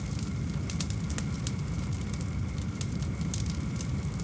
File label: Fire Torch; Star Wars Obi Wan; XBOX